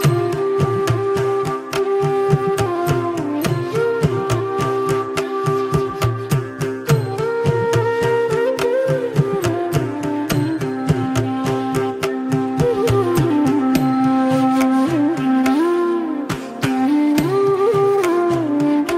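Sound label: background music ringtone; HARIVARASANAM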